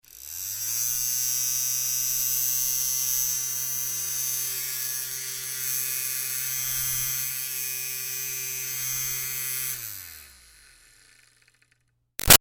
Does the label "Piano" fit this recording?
No